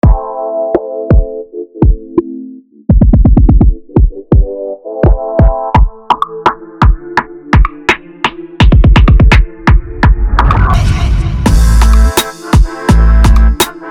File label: Instrumental